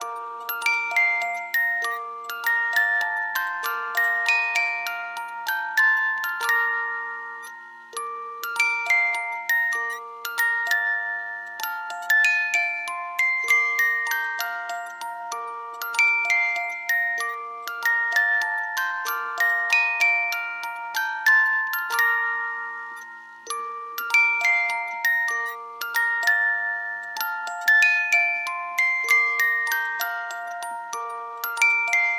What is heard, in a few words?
Rock A Bye Baby Music Box